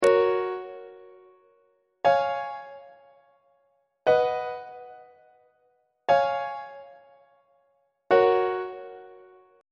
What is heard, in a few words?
g flat major perfect authentic cadence; on treble clef